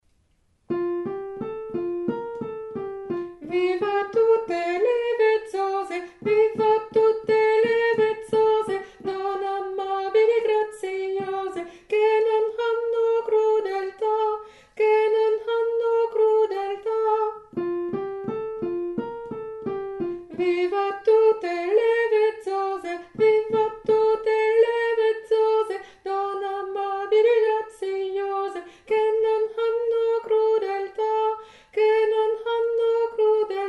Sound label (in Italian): Viva tutte le vezzose alto